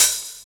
DR. LOOP, DRUMS; X-Static Goldmine 3

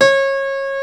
STENWAY; East West; Global Sounds

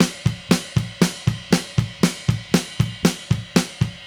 Drums, 70s Analog Funk

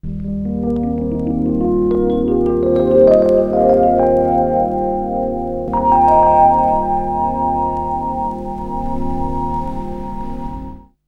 Melody, Drum & Bass Melodic Synth

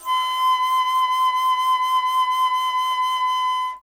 Alto Flute, Solo Instruments

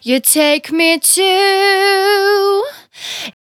Finally 70bpm C, Velvet Vocal; Zero-G

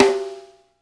PING R; Sampleheads - New York City Drumworks VOL-1; SW KITS

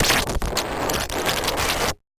Casio, Glitched, malfunctioning synth

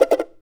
CHARANGO FX; Guitars; Spectrasonics; Hans Zimmer Guitars VOL-2

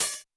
Roland U220 Dry Set; 90 s Sample CDs; 300 Drum Machines

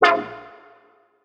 stab wup, stabs

